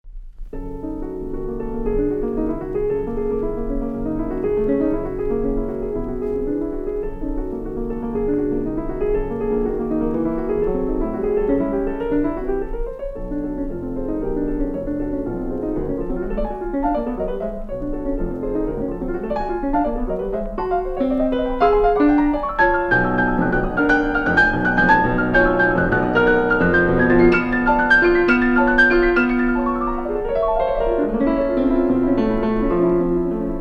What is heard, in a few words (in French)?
Sonate pour piano Allegro con moto; DUTILLEUX